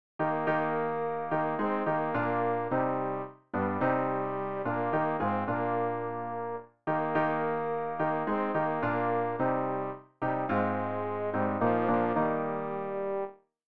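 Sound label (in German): tenor, Übehilfen; Ref. Gesangbuch; RG 071: Laudate omnes gentes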